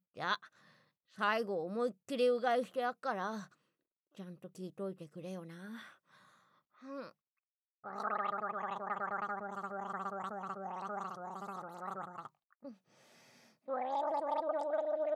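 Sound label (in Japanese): ザーメン ゲップ（3連発!!!）; ザーメンゲップ集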